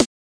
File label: melbourne bounce snare small; Hip Hop; STYLE KITS; D3ron Melbourne Bounce Kit